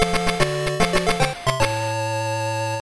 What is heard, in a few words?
Mission Start; Renegade; master system